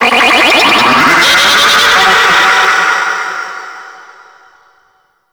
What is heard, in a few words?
Space Drone Attack; Warframe; Grineer